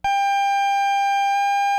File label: CX3 OVDRV, CX-3 organs; Greytsounds